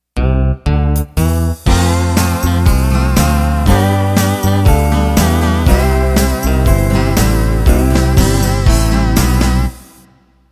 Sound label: Modern Country Intro